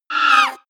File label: wraith attack short; schreie